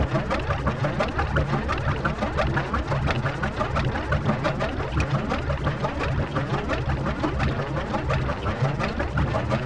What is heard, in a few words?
gear; GrandFantasia